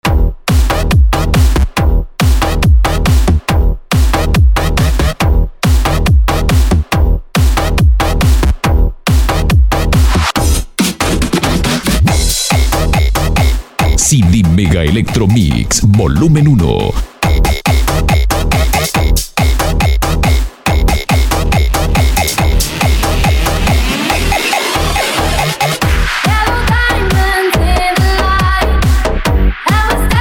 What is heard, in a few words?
mega electro mix